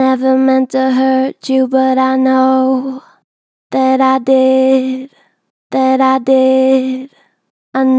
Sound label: Natural Vibrato Gentle, Harmor Vocals; Never meant to hurt you